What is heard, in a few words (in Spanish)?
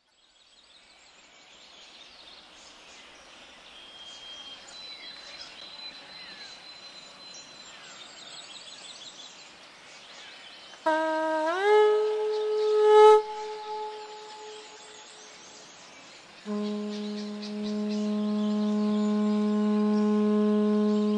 Rumba